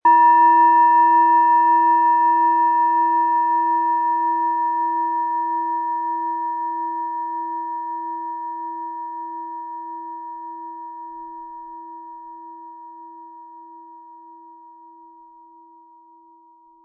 Planetenschale Hopi-Herzton 180-260 gr., mit Klöppel; Ø 10,7 cm; 180-260 Gramm inkl. Klöppel

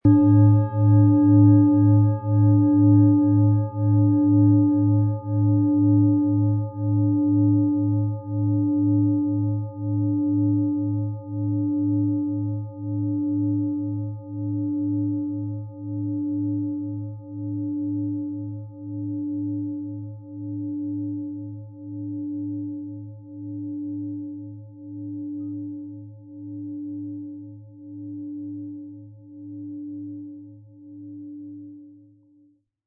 Planetenschale; Sound-Spirit

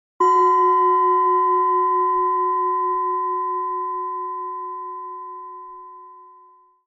Jupiter Planetenschale Ø 10,5 cm; 100-180 Gramm; in Handarbeit gefertigte Planetentonschale Jupiter; Wachsen und gedeihen & Umsetzen und in die Tat kommen

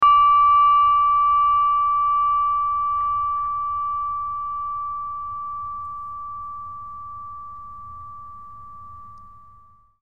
KL-MINI holz

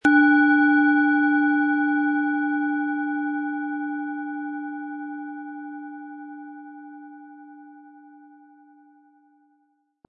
Saturn-Planetenschale Ø 10,9 cm; 100-180 Gramm inkl. Klöppel; Klangschale

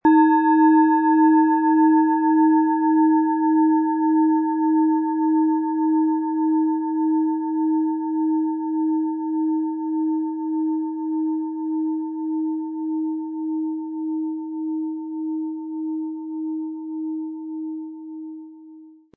Planetenschale Universelle Harmonie, Klöppel; Wasserstoffgamma, Ø 15,3 cm, 700-800 Gramm inkl. Klöppel

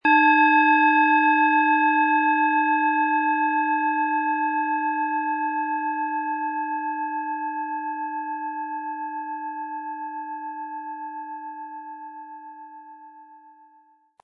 Venus Planetenschale; Sound-Spirit Shop

